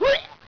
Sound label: DEATH; Caesar 2; DOS